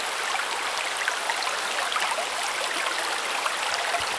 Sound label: fount; Mafie 1